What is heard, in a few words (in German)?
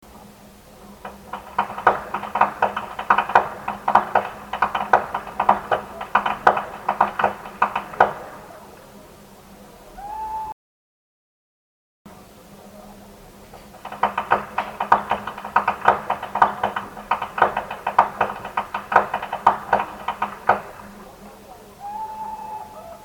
schnalzen, jugend; rossdorf